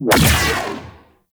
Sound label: Flying Spaceman Mega Beam Fire; Warframe; Venus